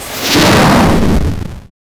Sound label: a launching rocket; aerospace